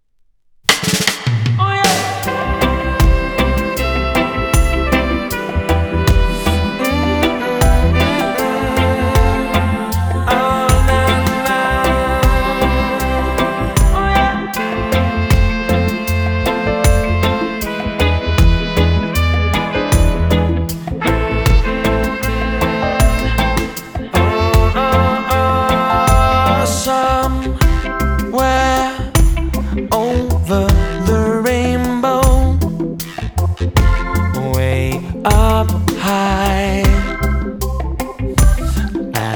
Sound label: Jamaican Reggae Cuts, Reggae